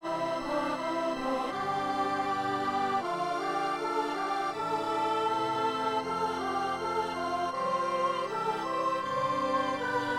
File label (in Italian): cantico dell agnello, soliste puis basse; Soliste et basse